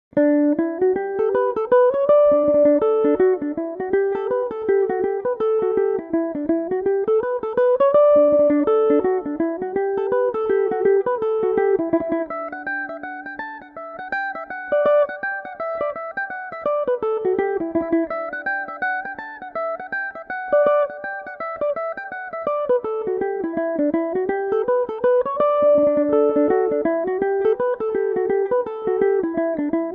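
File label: reel E dorian, irish mandolin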